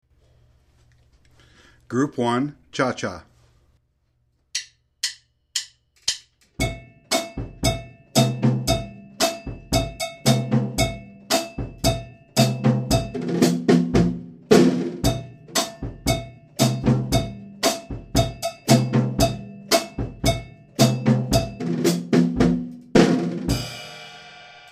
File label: chacha